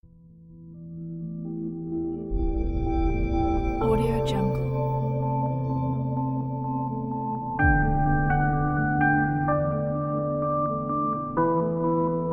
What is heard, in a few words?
enigma logo reveal